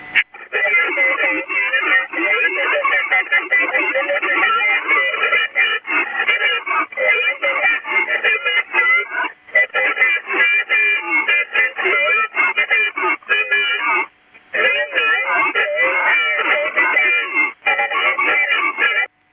HARRIS ANALOGUE / VOICE SECURITY (AVS); HARRIS RF-3575 RAU

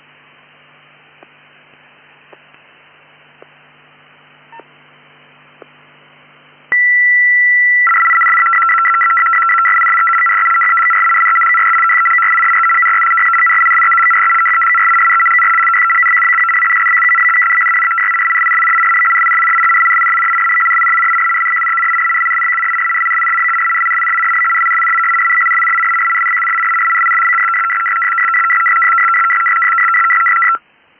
FSK 100; Polish Intel 100 Bd / 600 Hz FSK system with charateristic pre-transmission tones

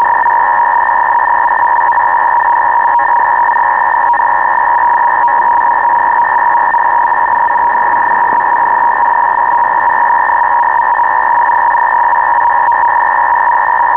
DUPARQ-II 250 TFC; 250 Bd system in tfc. condition

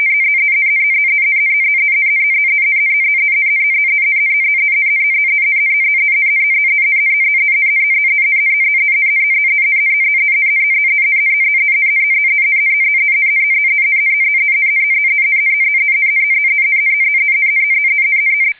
SITOR-B FSK FEC system; SITOR-B idling on ALPHAs; FSK-systems

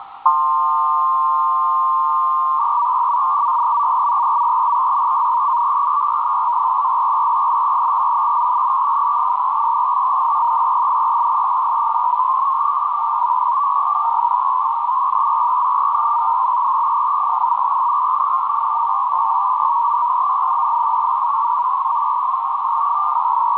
ISRAELI MIL/INTEL 300 Bd

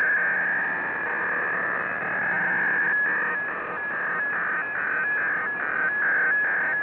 KRE PSK 300; North Korean serial tone modem running 300 Bd; NORTH KOREAN DIPLO

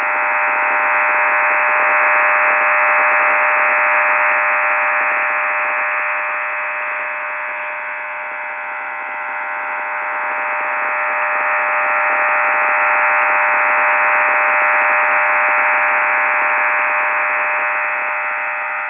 MIL 16-tone

